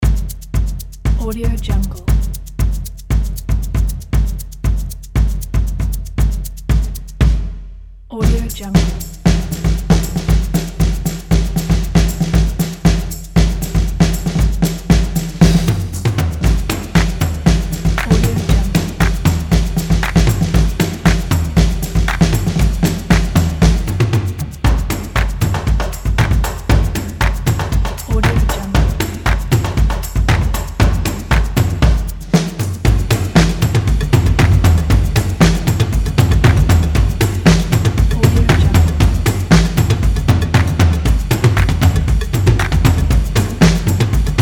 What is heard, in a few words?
percussion; shumatar